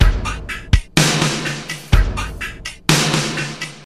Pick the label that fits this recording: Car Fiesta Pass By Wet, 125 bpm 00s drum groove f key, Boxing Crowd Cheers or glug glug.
125 bpm 00s drum groove f key